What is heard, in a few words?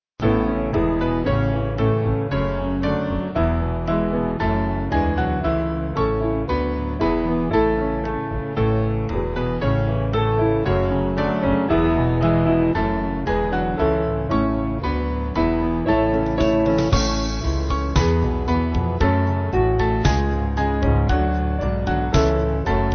Band